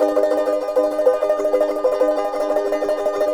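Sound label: charango major, guitars; Spectrasonics; Hans Zimmer Guitars VOL-2; CHARANGO CHD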